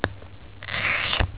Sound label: glass cut